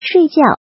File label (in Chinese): 睡觉